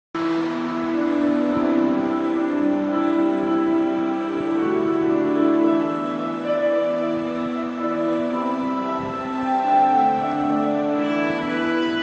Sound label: short violin cover